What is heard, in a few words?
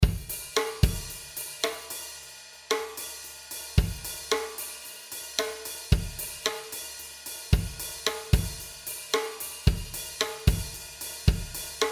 Dm112 Seven Four Groove